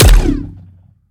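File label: Sci weapon Shot Medium Gun Warp Sniper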